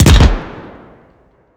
explosion mortar distant urban; weapon; Bluezone Corporation

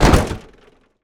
steampunk mechanical metal element heavy; Bluezone Corporation

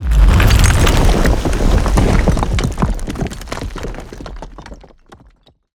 debris falling rock explosion rumble; Bluezone Corporation

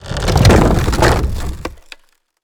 texture wood; mountain dweller; Bluezone Corporation

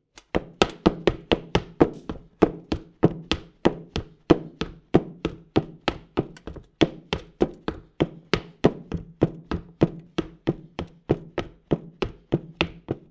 Normal Wood Shoes Running; Todd Blankenship

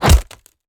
creature wood attack whoosh impact; Bluezone Corporation

